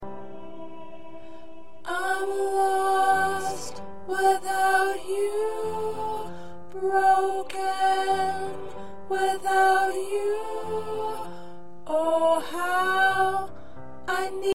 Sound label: Soprano